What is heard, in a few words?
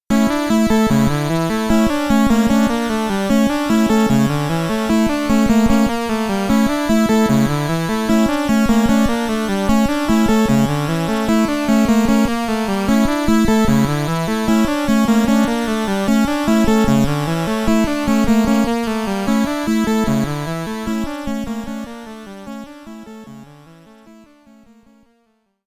Acquisition BGM; konami famicom chronicle vol.1 disk system edition